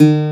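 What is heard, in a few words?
Steel Acoustic Guitar E3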